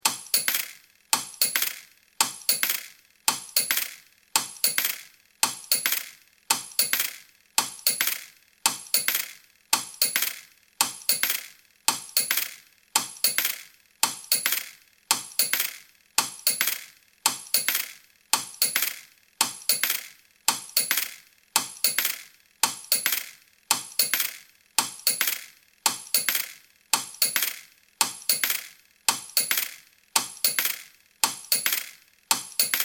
Looping flicking of metal coins being manufactured; Coin Machine; Money; Objects